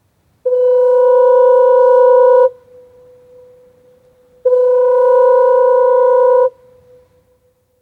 Flamborough Head Lighthouse's Foghorn sounding (Nautophone horn, c1960s) (Close perspective); Boat; BBC